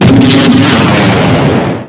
rocket launcher fire; weapons

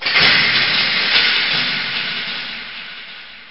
metal elevator; kz ins industryrun

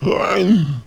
zombie pain

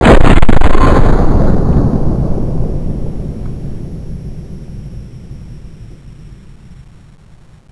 gold fire, weapons

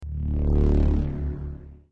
metal rattle

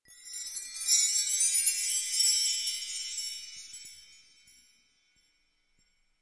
cup magic

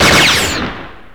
laptopgun fire, weapons

